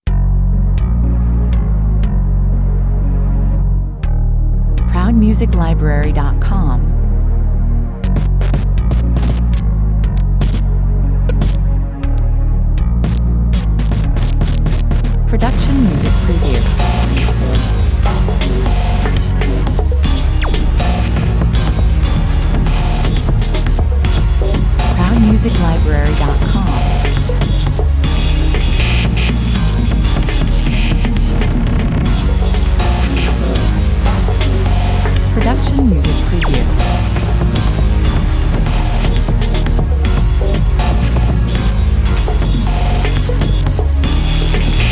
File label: meditation